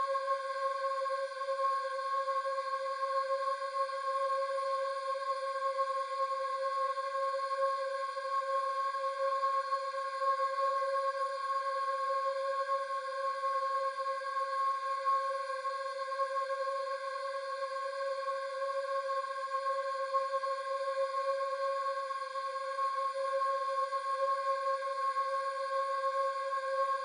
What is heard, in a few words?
Drone high vox very slight waivers with breathy elements and faint metallic; note